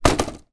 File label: wooden box small lid drop close; foley; zapsplat